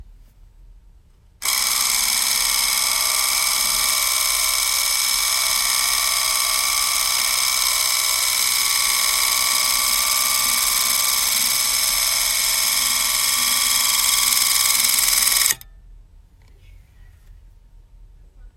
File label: Model T Audio of Motor Running; Revere Tower